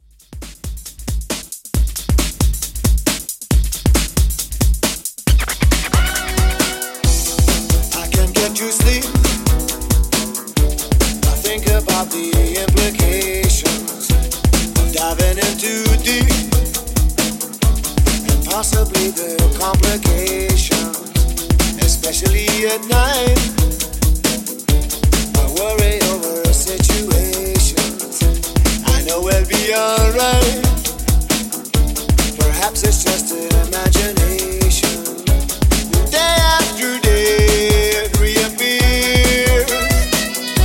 80s Rock Redrum 136 Bpm Clean

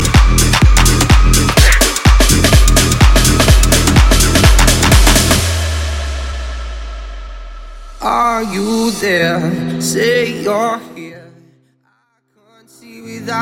EDM Bootleg 125 Bpm

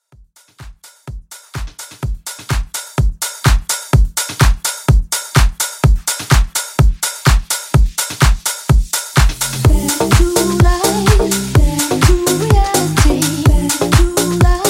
Deep House Hype Redrum 126 Bpm

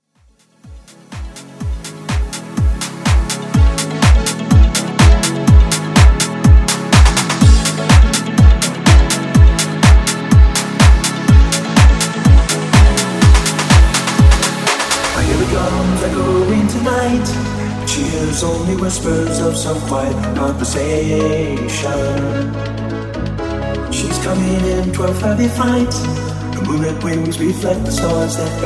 House Vocal

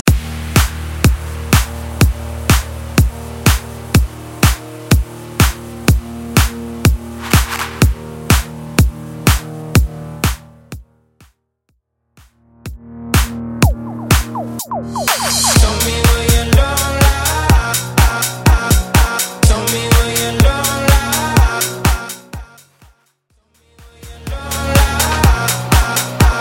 House Vocal Club Mix 124 Bpm; Mainstream VIP; Vegas Edit

